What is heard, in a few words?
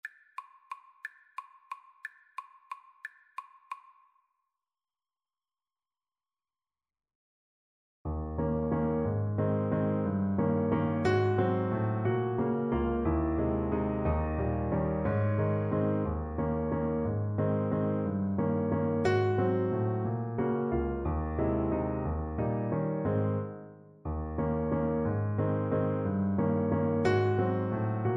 Waltz, Trumpet; Chopin Waltz 1 Op18